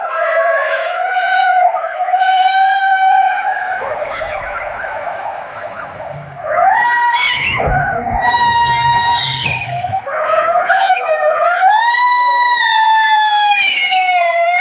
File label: all sounds that from tige, breathing